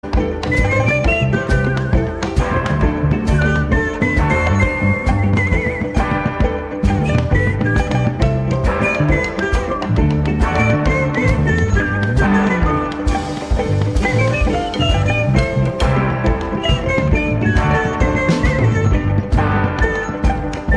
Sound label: Whistle